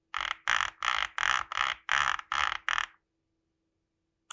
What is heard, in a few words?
GK650K Scroll